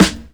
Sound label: puffy steel snare drum sound D key